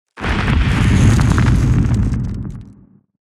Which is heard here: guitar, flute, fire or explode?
fire